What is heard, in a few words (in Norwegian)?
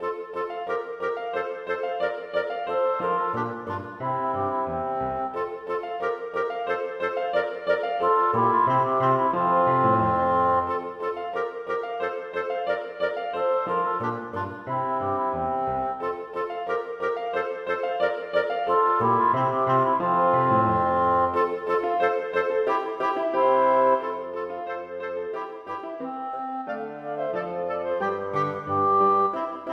Burleske for to klarinetter, fagott og piano